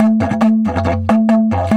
F 140, DIDGE RIFFS

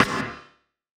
rim, gated reverb; musicradar

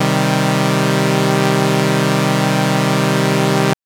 Synth Saw min c2; TrashLibrary

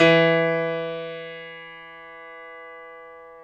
PNO, Grand Pno; Club-50 - Foundations Roland

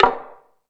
House Machine, E-MU Pro-Cussion

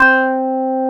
Korg CX3, C 1

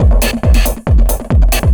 138, Techno, drum loops

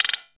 PERC, 170 BPM; Ueberschall; Drum & Bass Flash Trackz

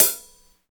HAT; East West - Phat & Phunky CD2; 90's Sample CDs

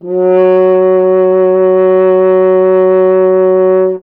F. Horn; Miroslav Vitous - Solo Instruments VOL-2; Solo lnst. II

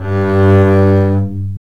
STR ARCBS, Cb Bowed, mf vb; String Master Series

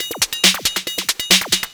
drum 138, drum-loops, 2bass, Techno; Techno ID

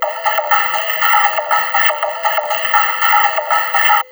RYTHM 120, Drum Loops Crazy Processed

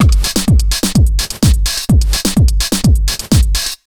127 beat 05 R, Fresh Disco House; USB Soundscan vol.20; AKAI

